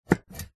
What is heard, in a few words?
vehicles bicycle pushbike front wheel brake pump engage no wheel movement